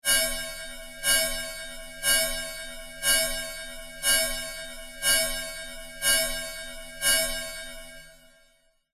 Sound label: science fiction alarm warning buzz harsh large reverb